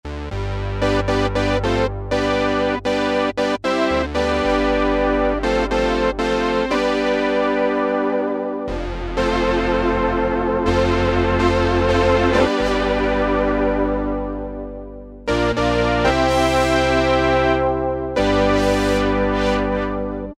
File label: Juno-6 Power, Vintage Synth; Roland SR-JV80-04